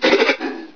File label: alert